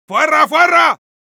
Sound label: battlecry, es; Medic